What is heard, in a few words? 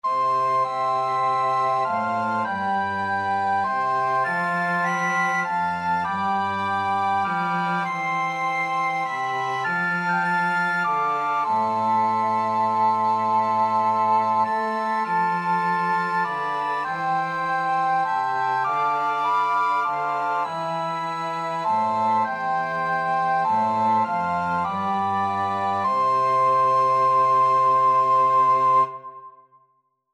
flexible mixed ensemble, 4 players